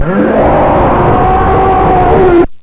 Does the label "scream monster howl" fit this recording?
Yes